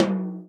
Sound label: tom, Real Drum Kit, jungle kit